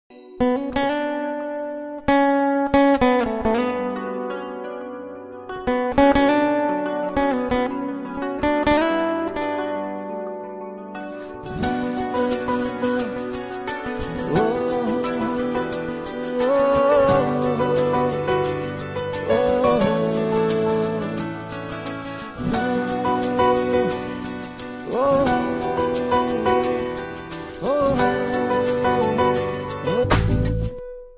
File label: Bangla Music